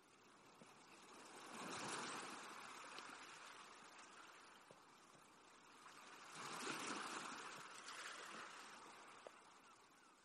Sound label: calm seashore